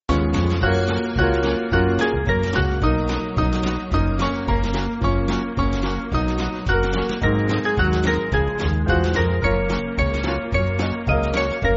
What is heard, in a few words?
Band, church music